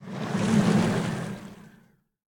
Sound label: glide; smite; poseidon